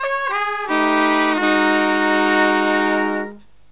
Killer Brass Riffs; USB Soundscan vol.29; AKAI

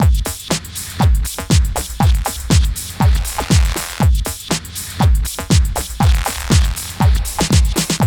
GHET ROL; Best Service; Advanced Media Trax Building Blocks; GHET ROLL

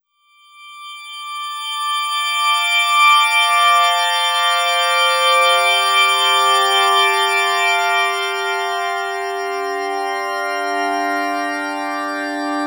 FM Melodic; Sonic Foundry (Sony Creative Software); Slow Silhouette

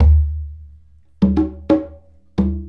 Congas; Essential Percussion

